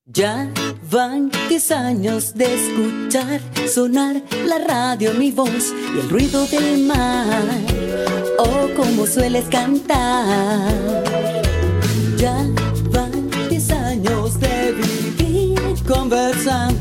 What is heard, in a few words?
jingles